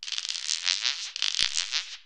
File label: magic block; Paradise